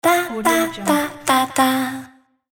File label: vocal logo; crazytunes